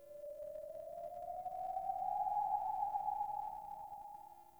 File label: Venus Landscape Distant Corpus Texture, Ambience; Warframe; Venus Open World